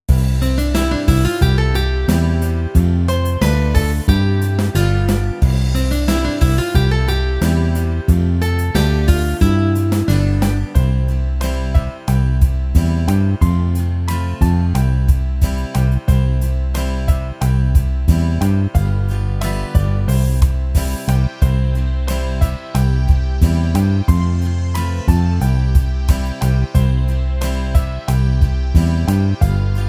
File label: Karaoke